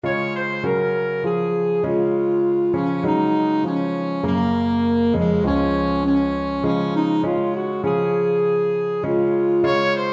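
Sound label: girl left alto saxophone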